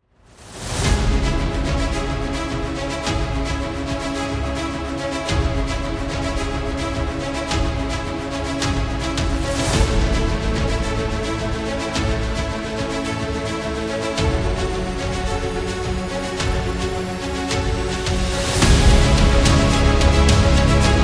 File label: Epic Drama